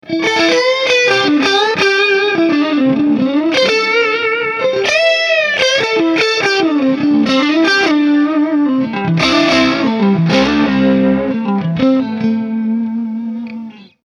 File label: bluesy lead, guitar